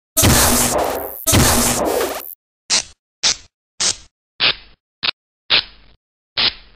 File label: Sparks; Station Obscurum